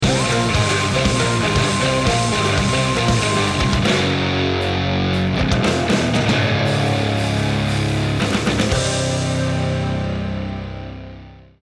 round ten sec count, music; hades

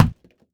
fist hit; physics

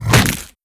bite player, creatures, barnacle; Half Life Alyx

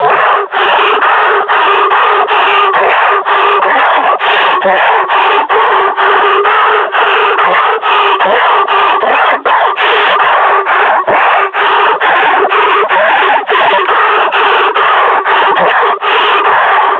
breathing, combine grunt

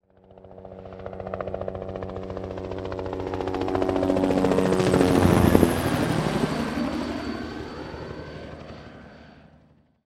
helicopter flyby; overhead; Counter Strike Model Pack; CS:GO

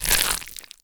slide; headcrab classic, movement; creatures

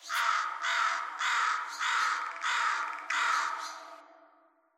amb crow caw; c17

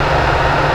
projector; Counter Strike Model Pack; CS:GO

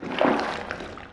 writhe, headcrab; creatures; Half Life Alyx; zoo

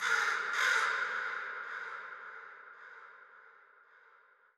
crow, ambient, nature, birds; Portal 2

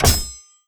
truck gun reset

